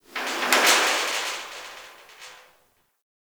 Gas City Rattle Distant Metal; Ambience; Warframe; Corpus Gas City

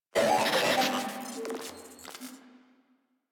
Moa Vocal Pain; Enemies; Warframe

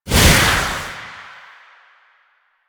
Light Beam End; Warframe; Eximus; JadeLight

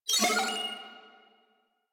Pick Up Life; Warframe; Caliber Chicks minigame; pick ups

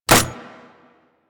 Rolling Drone Landing; Warframe; Grineer

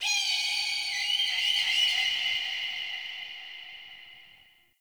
Day Bird Of Prey; Ambience; One Offs; Glades Of Andurin

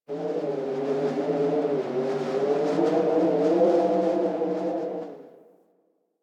Landscape Cave Wind Howl, Ambience; One Offs; Warframe; Venus Open World

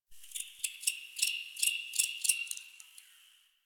Tree Critters Happy Calm; Warframe; Duviri

